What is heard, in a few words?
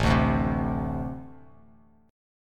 A-Minor Double Flat 5th A Bass Standard; strummed; JGuitar